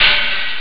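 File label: hi-hat; jazz jackrabbit 2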